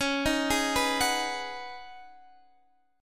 Db 7th Suspended 2nd Suspended 4th, Guitar, DADGAD; x,16,13,13,14,16; Db7sus2sus4 arpeggiated